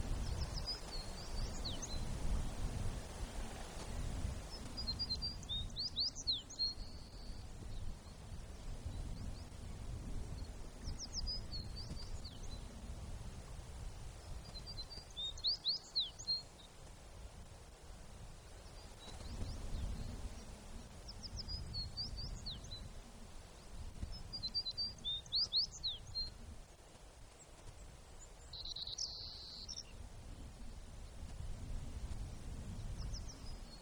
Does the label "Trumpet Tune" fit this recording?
No